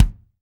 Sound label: BD, DR BD; Best Service Gigapack 2